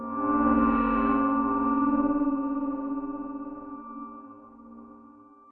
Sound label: Portal; SuperOrxoBros